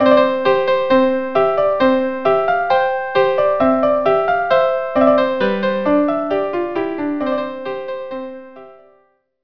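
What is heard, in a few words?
violin violin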